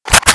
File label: t68 remove, weapons